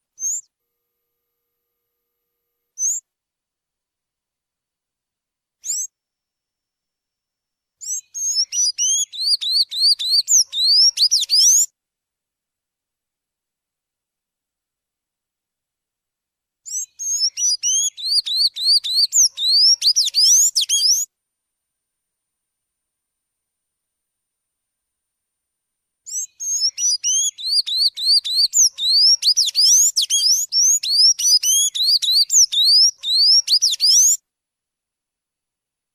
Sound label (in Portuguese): Canto de Sanhaco Azul Canto Violino